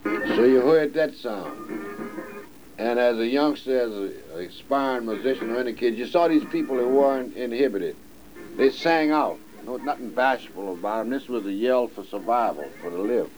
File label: Yell